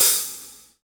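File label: Reg. open hi hat, Roland BK-5 hi hats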